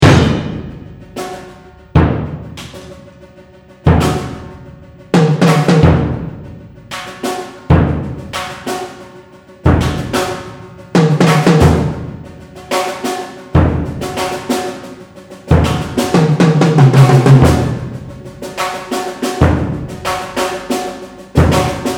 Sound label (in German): Sonatensatz Schlagzeugquartett